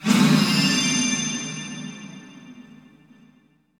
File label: Magic, Whoosh, Deep, Sparkle